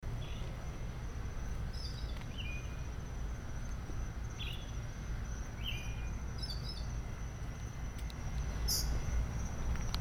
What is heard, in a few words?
night bird, bahamas; Heaven & Hell in Paradise